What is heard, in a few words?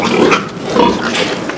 pig mono; fpig